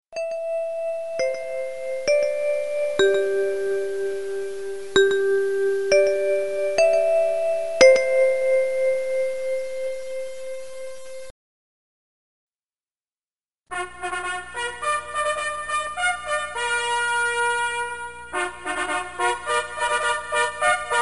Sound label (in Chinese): 集合号; 报时; 报时430